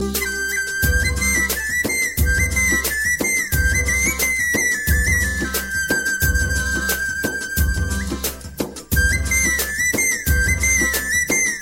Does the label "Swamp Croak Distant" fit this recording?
No